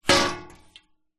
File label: impacts metal frame bed hit light